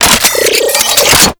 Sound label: Archwing Mach Gun Equip; Warframe